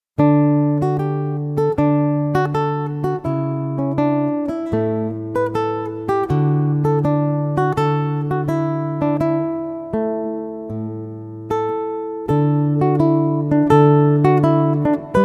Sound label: Solo Guitar; Adagio, Vivaldi